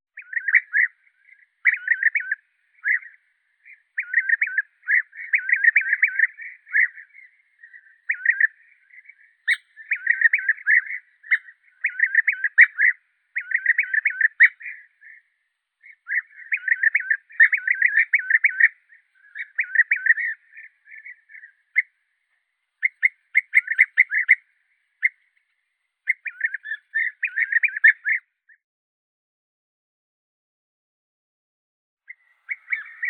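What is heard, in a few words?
golden bee-eater, Merops apiaster; shurka zolotistaya; zvuki prirodi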